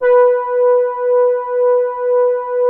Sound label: soft brass H4; SOFTTRIX; Music Store - Claudius Diemeter Schwerlos